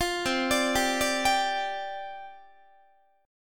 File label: C Suspended 2nd Suspended 4th, down, Guitar